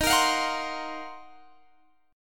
D sharp Minor 6th Add 9th D sharp Guitar Standard, chord; strummed; JGuitar